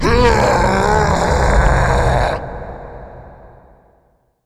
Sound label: supermutant behemoth attack